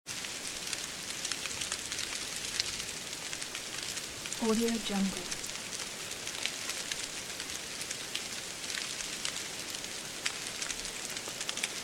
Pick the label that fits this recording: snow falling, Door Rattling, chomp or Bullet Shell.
snow falling